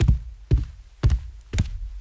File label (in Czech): beh; Mafie 1